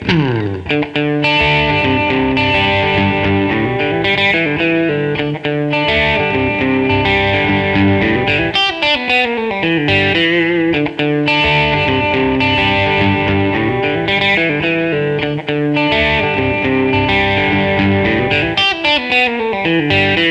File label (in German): Hals und Stegpickup alle Spulen; Petrucci BFR